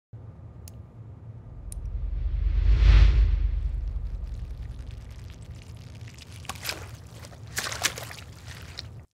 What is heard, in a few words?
paint splatter; پاشیدن رنگ